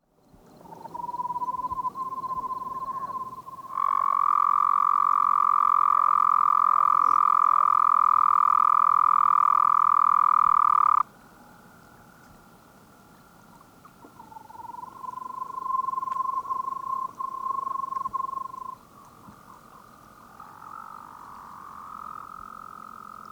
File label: Anaxyrus microscaphus